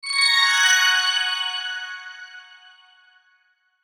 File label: Logo Shimmer Descend; Melody; Delay; Blastwave; Sonic Logos